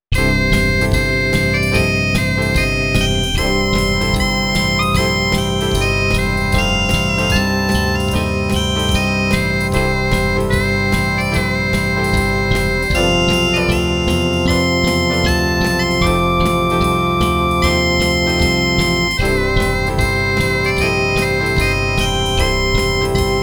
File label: midi blues